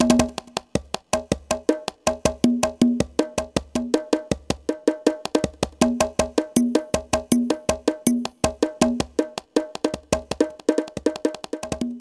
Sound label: Tune Bongo